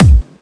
Trance Kicks

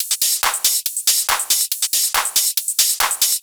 Loops 140 BPM Hihat Percussion Loops

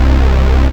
BAS, Eurotech; Sounds Good

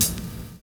VINYL HH, HH CLSD, Drums Dance & Groove

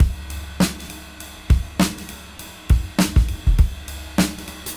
Cym Beat 99 BPM; AMG - Keith LeBlanc Phat Butt Jams

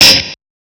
Hats, Alesis Performance Pad

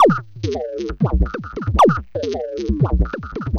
Drums Strange

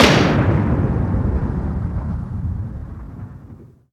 Impacts, Effects

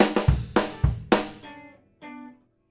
FILL

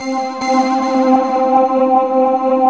WOBBLER, SOFT PADS; Zero-G Ambient 1

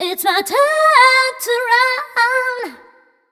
f mel c, fem Vocals; Mutekki Media; Diamond Vocals 2

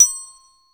C4 glock; InVision Interactive; Lightware VOL-1; Instruments & Percussions; GLOCKENSPIEL

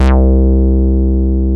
SQUARE, BASSES; Masterbits; Vintage Voltage

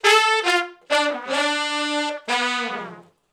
Shuffle Blues Riff (D) unison, Horncraft for R&B; Sections

